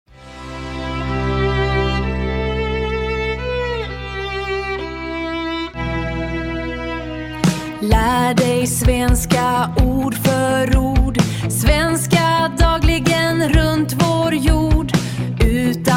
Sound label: jingle